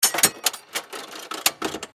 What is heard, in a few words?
Steampunk Gear Wind, Scifi, Steampunk, Gear, Wind, Device, Clicks, Crank; Science, Fiction; Steampunk Sound Effects